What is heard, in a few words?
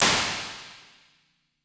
Hybrid Plates Plate, Impulse Responses; Ableton Live 12 Lite